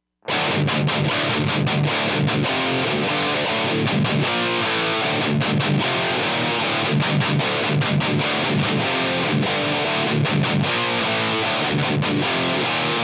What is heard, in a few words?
Hellfire A5 6 String